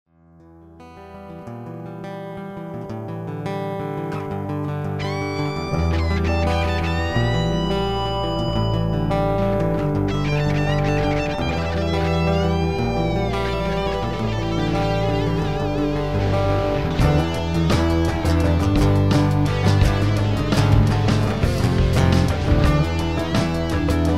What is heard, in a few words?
Different Strings